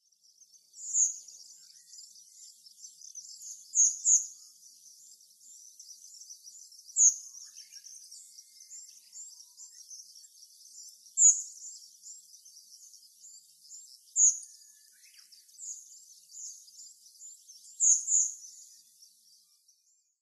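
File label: Brown-headed Thrush; akahara; watarase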